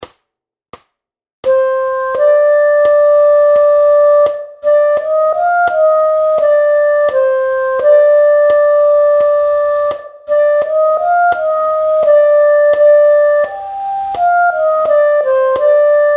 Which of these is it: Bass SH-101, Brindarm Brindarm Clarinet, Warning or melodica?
Brindarm Brindarm Clarinet